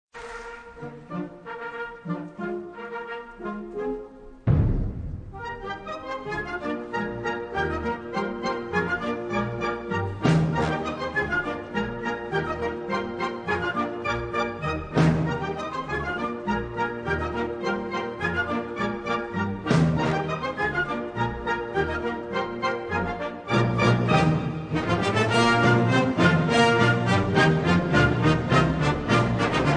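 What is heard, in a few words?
Polka, Band; Champagner Polka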